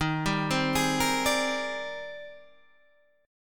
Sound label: G sharp Major Sharp 11th, guitar; 11,10,10,13,11,10